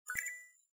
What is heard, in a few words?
nav click